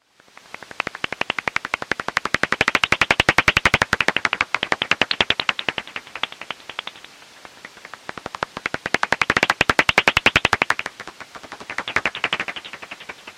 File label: daubenton bat